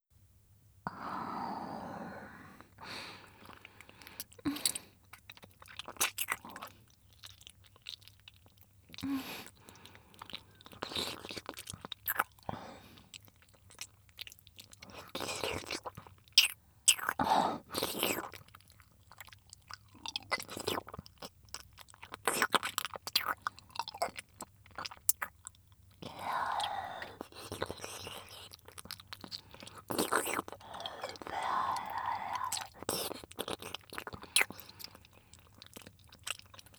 キスB(ディープ), ASMR; 清楚ビッチエルフ姫のメガ盛りマントロフルコース

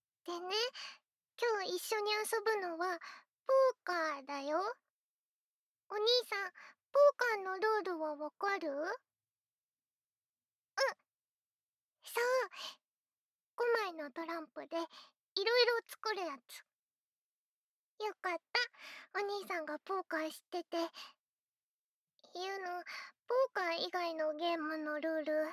耳舐め手マン手コキ

